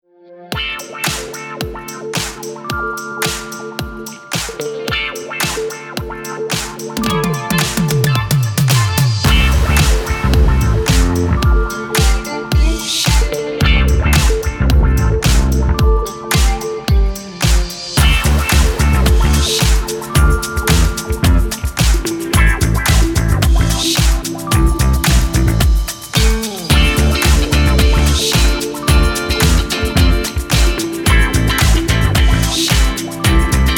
Funky; positive and upbeat

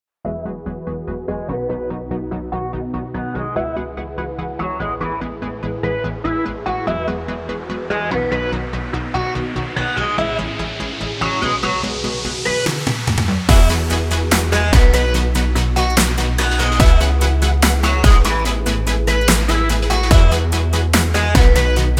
Future Bass; pop genre that uplifts your spirits

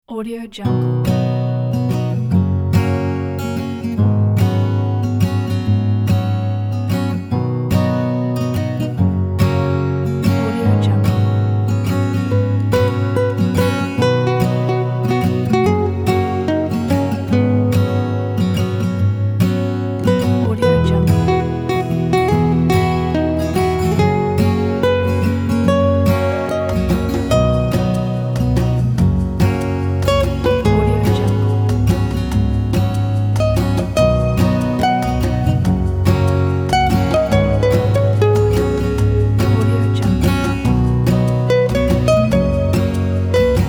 Happy Walk

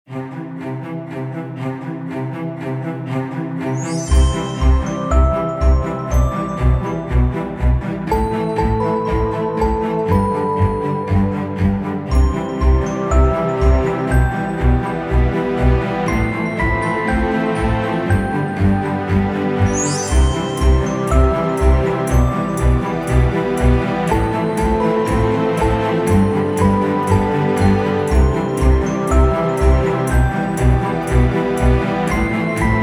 Christmas Atmosphere; carol of the bells

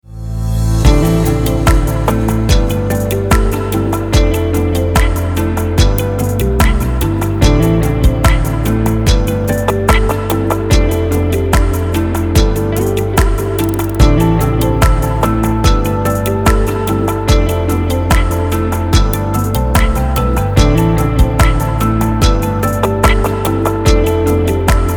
Bliss Sad Ambient

